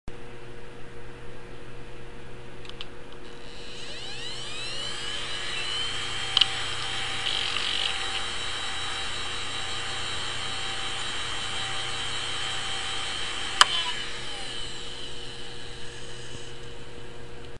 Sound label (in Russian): Zvuki izdavaemye polnostyu ispravnym vinchesterom Fujitsu